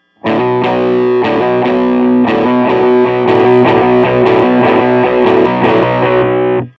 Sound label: disco, edge delay